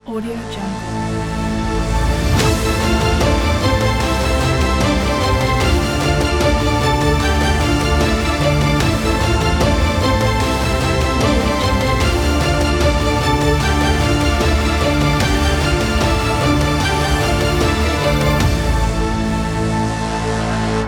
epic blockbuster logo

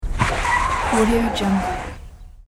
tires skidding screeching

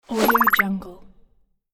bubbles whoosh

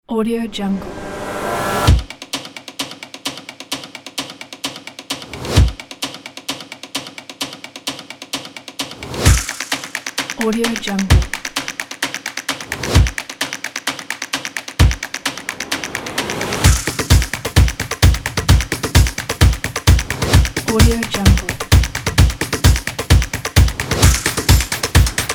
action commercial percussion

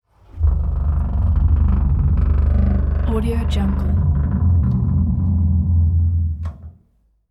textures porsche 964 trunk moaning; Robotic Lifeforms 2

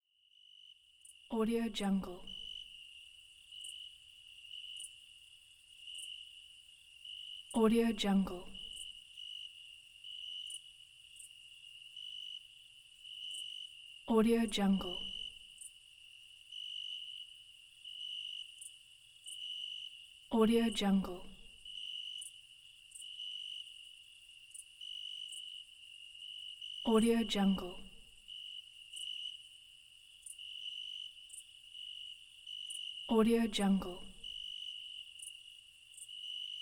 night forest

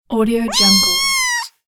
the meow

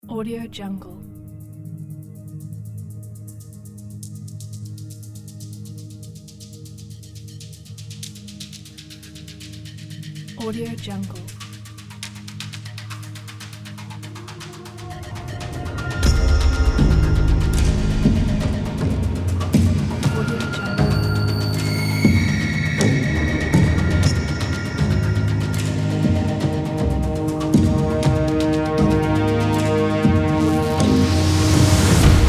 cinematic detective

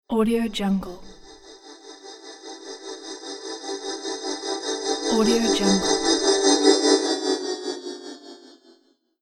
tremolo transition movement

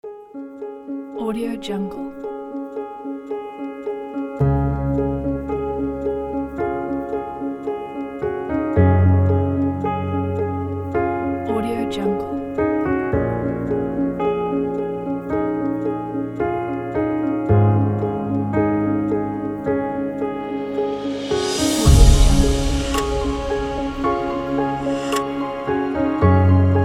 cinematic ambient emotion

